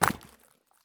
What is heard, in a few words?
sprint glass; Escape from Tarkov